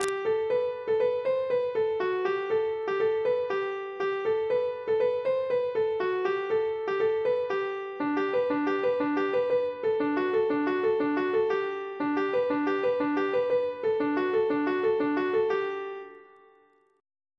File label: polka; boeuf trad